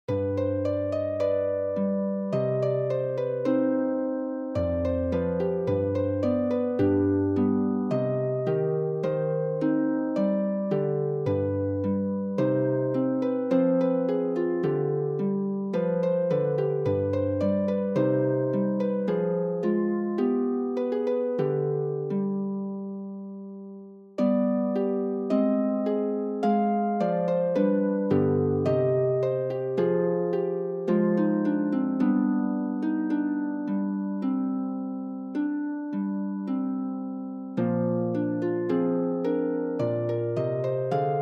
Folkmusic Bulgarian Style

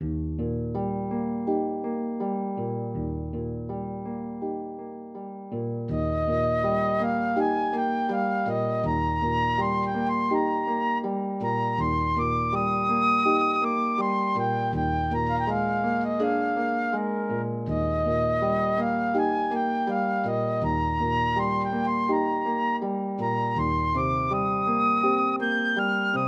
Flute and Harp; entracte carmen

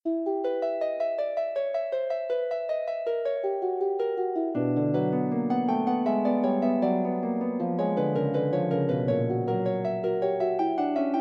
Fugue, Harp; Forty Eight Preludes and Fugues Book 1

